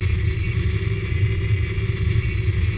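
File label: engine run bwing loop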